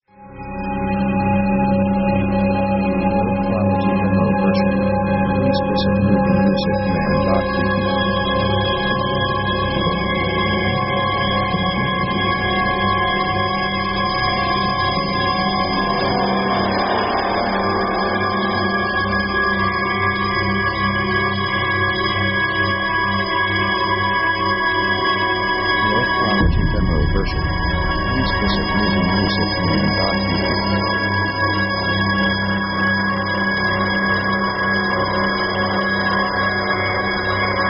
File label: mystery thriller; synth themes